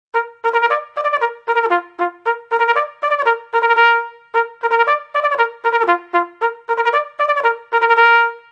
Dinner Call, bugle

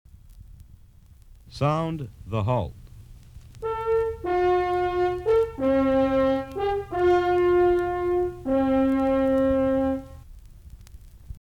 The Halt Bugle

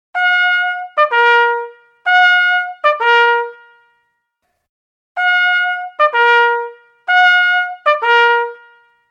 Lie Down, bugle

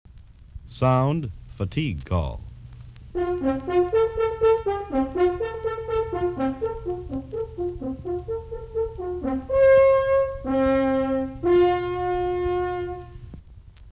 Fatique Call Bugle

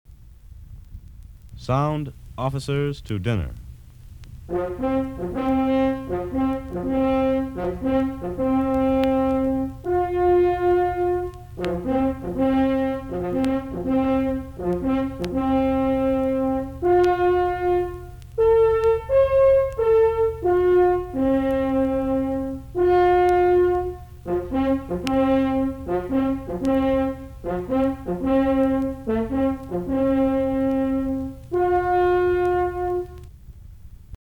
Officers Call To Dinner Bugle

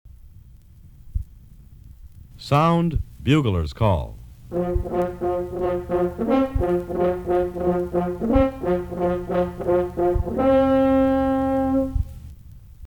Buglers Call Bugle

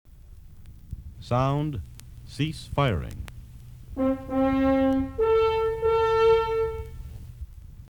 Cease Firing Bugle

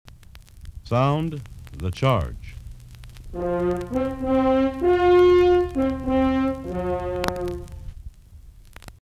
The Charge Bugle

